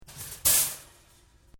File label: Shopping Trolley Child Seat Open; Stores and Malls; Backgrounds